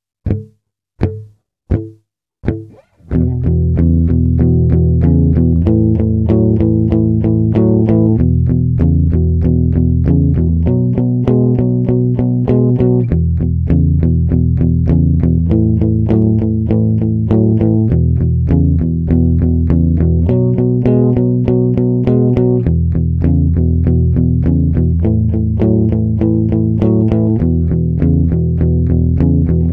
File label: 4 bar, Pentatonic; pentatonic fun